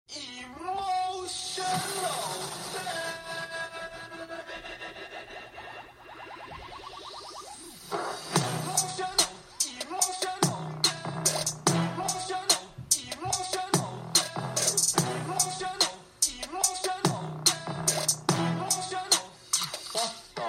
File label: emotional damage remix